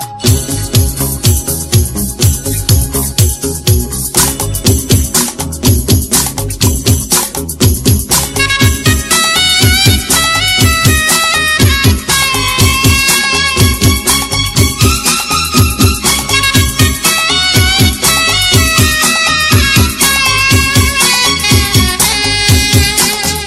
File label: Instrumental Ringtone